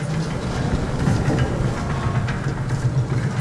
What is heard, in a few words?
door pull loop; game door